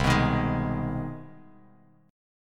C Minor Major 7th Sharp 5th, Bass Standard; CmM7#5 strummed; JGuitar

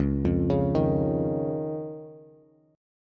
C sharp Major Add 9th, Bass; C#add9 arpeggiated; JGuitar

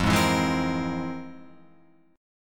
F Minor Major 11th, Guitar, Open F; strummed; JGuitar

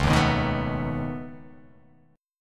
C sharp 7th Suspended 2nd Sharp 5th C sharp down

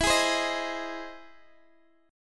E Augmented Major 7th E, guitar

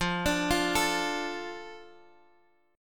F 6th F Guitar DADGAD; 15,17,15,14,x,x; arpeggiated

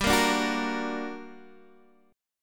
G Minor Major 7th, down, Guitar, Open D

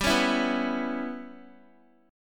G 6th Flat 5th, Guitar; G6b5 strummed; x,10,11,9,11,x

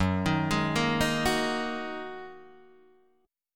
F sharp 6th Add 9th Guitar Open D, chord; 4,6,6,4,6,4

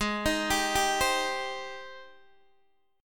Ab Major 7th Ab down Guitar Open D

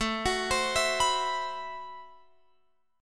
A Minor 6th Add 9th A Guitar Open F; x,16,18,19,19,18; arpeggiated; Am6add9

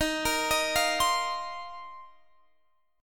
Bb Suspended 2nd Suspended 4th, chord

